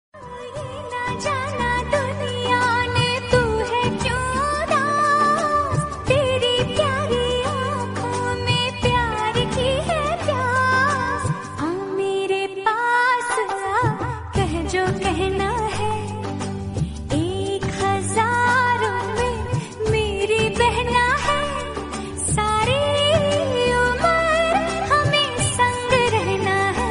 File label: romantic hindi